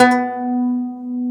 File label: Segundo Domra B2; Planet Earth; Digital Sound Factory Planet Earth